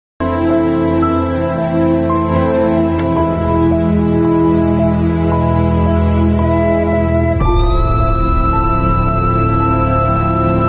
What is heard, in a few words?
sad bgm ringtone; Singapore Saloon